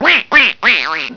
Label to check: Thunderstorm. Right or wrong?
Wrong